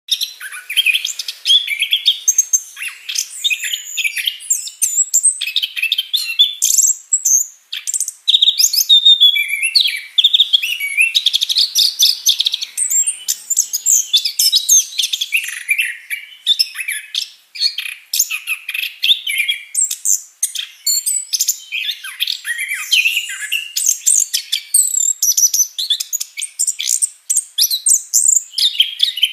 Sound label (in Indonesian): suara anis kembang gacor full isian